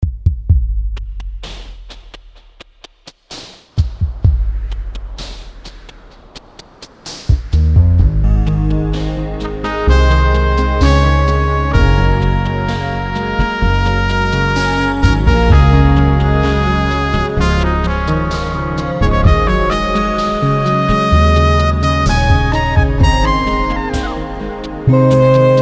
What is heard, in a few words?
korg z1